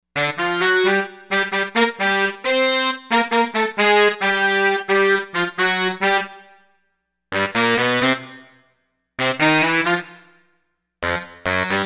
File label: Sax Section